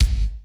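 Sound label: bass drum PITCH; Crypton; DrumBase5000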